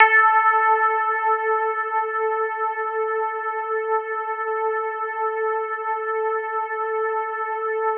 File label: basic